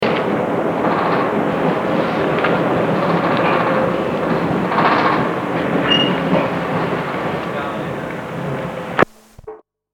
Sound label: shrine dictorphone mexico, street recording